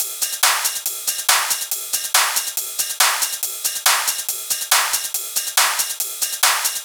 drum Loop 140BPM Perc

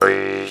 MT G, JHARP G B; Xsample VOL-9

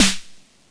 606 Snare Hard, drum kit; Concrete Collage Drum Kit; Concrete-606

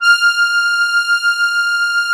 HOHNER, akkordeon

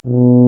tuba, brass; Solo Brass

